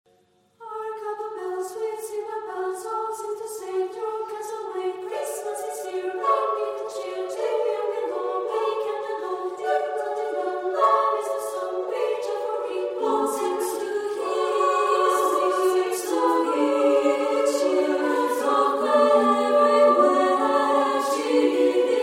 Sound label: hark how the bells SSAA choir; female choir (SSAA); CAROL OF THE BELLS